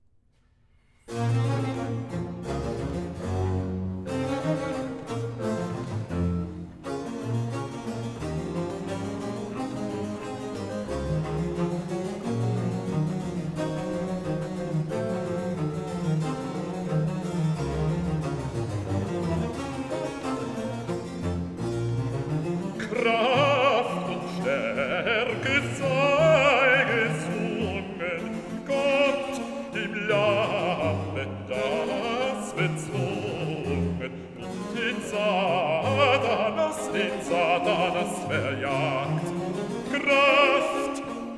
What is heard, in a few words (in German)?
Aria Kraft und Starke sei gesungen (Bass); Man singet mit Freuden vom Sieg, BWV 149